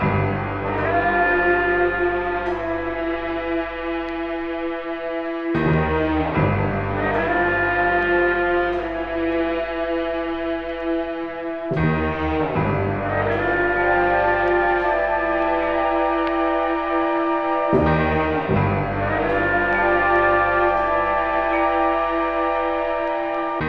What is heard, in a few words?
strings and horns, Sad; loops